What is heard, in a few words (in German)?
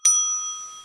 Ding Hell